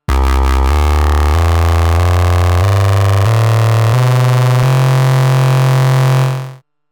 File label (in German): Waverazor Dual Oscillator Slice Keytrack minus 50 Prozent; MOK